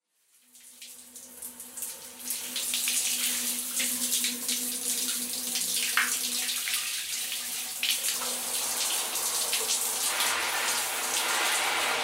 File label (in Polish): Odgłosy wody Kąpiel pod prysznicem; skaczace zabki